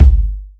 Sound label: boomy kickdrum sound g key